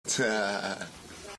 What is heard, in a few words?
laugh impressions